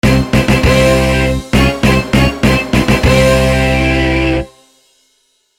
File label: minor fanfare, jingle